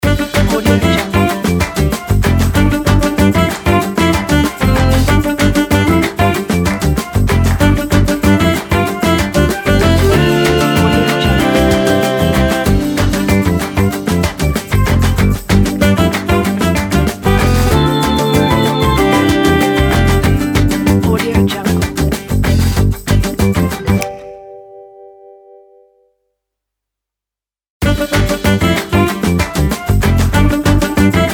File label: fun show opener